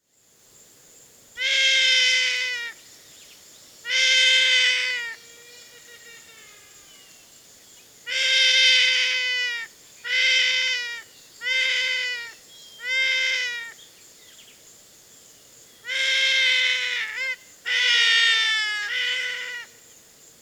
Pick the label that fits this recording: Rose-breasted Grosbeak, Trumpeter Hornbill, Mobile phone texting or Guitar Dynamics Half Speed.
Trumpeter Hornbill